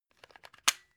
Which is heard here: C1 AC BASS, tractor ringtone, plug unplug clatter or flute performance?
plug unplug clatter